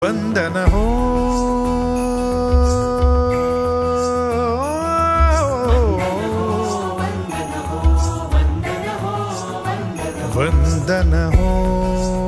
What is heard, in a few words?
Ringtone; sangeet manapmaan